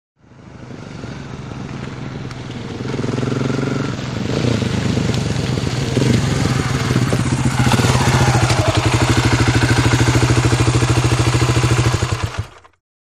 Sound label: Motorcycle; In / Stop / Off; Honda Trials Bike Up From Mid Shot, Stop At Mic. And Switch Off.